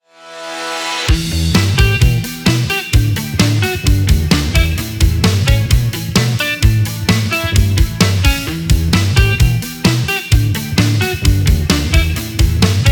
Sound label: indie Pop Energy